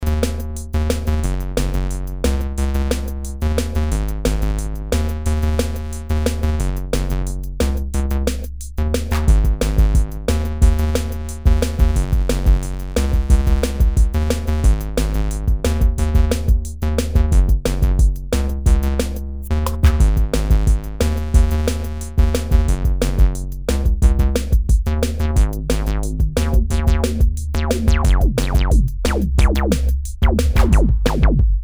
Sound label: seq, korg ms20 synth